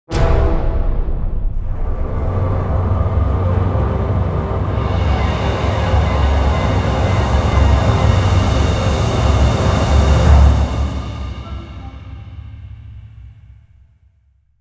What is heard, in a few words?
Nature Death; Nature Jotun Death